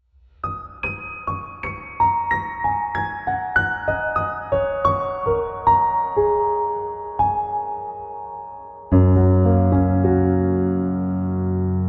piano and guitar chambers; Capitol

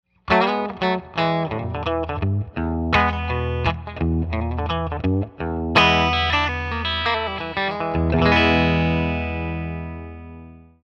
brass

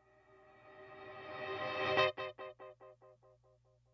reverse reverb and tape echo; AMS; EP34

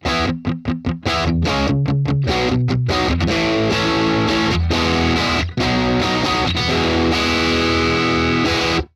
Guitars With Fixed Polarity

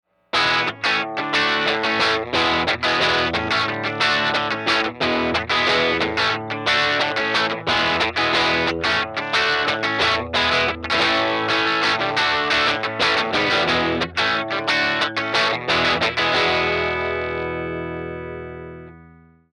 Tele bottom inst channel low cut off digging in

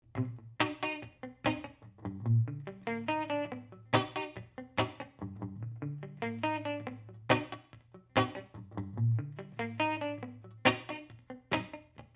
left dry right reggae; 906 ocean way

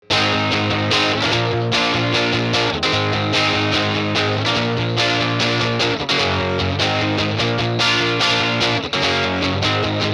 strat tweed; UAD-tweed

